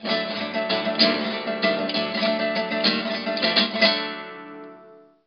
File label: guitar riff; mi3; el-pollo-diablo